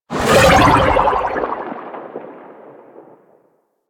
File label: Insect Liset Fly By Quick, Vehicles; Liset Flight